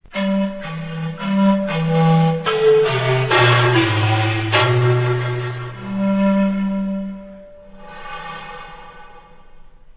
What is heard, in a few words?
zamponas alone